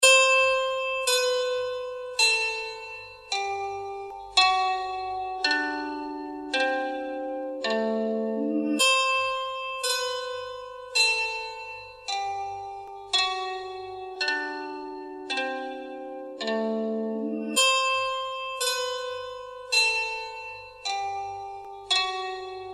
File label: Lullaby